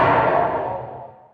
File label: force knockback end